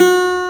ACGUIT B2, BassGuitar; AKAI S6000 CD-ROM - Volume 1

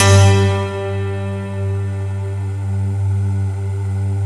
JV-1080 BELLS PNO; Syntec; Wall of Sounds

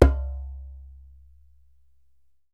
DJEMBE, African Perc

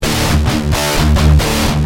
Guitar Heavy, Industrial

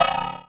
Percussive Hit, contact mic

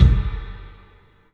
KICK FX; E-MU Producer Series Vol. 5 – 3-D Audio Collection; 3D Percussives

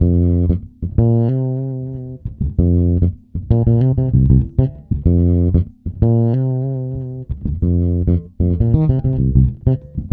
F, funk, bass; Spectrasonics; Bass Legends

